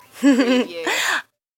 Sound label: Lady Giggle